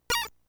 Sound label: No go, NES; lemmings